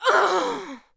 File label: fall; monster